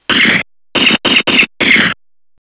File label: Bullet